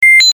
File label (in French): Bip bip